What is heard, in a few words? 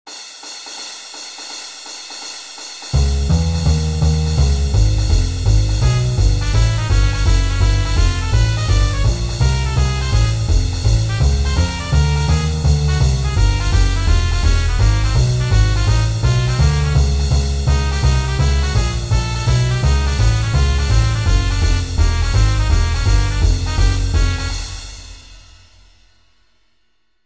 fake Bebop